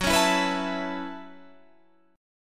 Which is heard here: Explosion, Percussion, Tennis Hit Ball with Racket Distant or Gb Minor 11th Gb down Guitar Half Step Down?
Gb Minor 11th Gb down Guitar Half Step Down